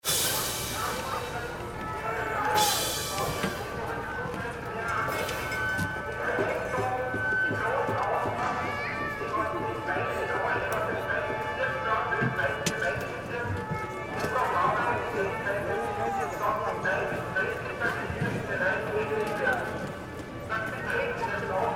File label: atmo long